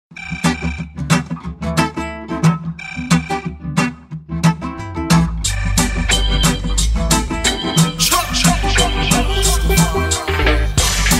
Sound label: Summer Ringtone